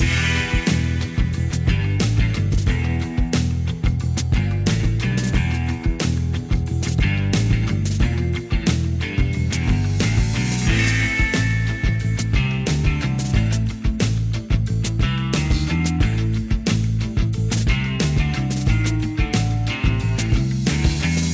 Shop Theme

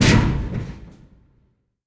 Pilon Low Impact

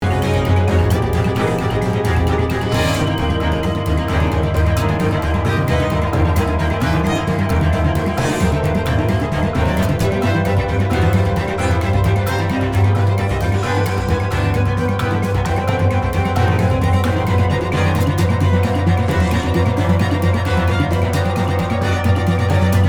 Action (Loop); The Walking Dead: The Telltale Series

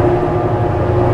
Servo Huge LOOP; Puddle; puddle plus ios gamerip 2018

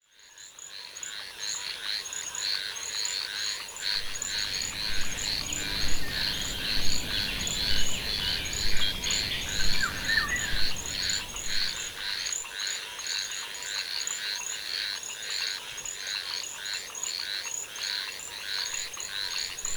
Swamp Ambiance

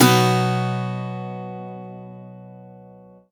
Acoustic Guitar Impact; deputy dangle

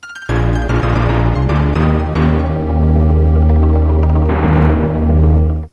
Lose Life; Donkey Kong Country 4 The Kongs Return Demo 2; Hornet Fury

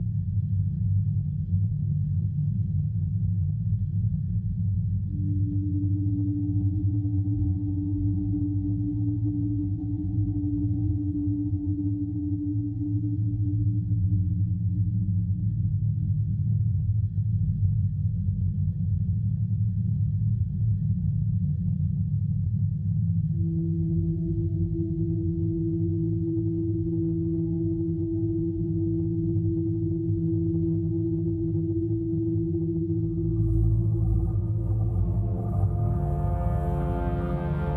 Low Rumble; PS4; Cat Interstellar

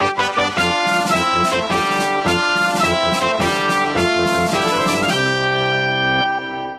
Baseball Fanfare

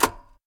Button Activate; Puddle; puddle plus ios gamerip 2018

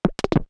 reload; GONNER

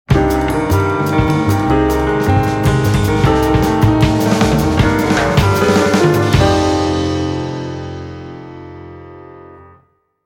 Jingle Start; Gran Turismo HD Concept

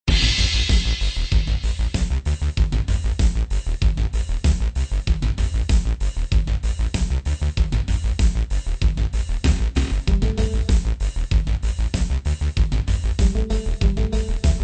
Combat Music; Telepath RPG Chapter 1

